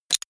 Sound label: click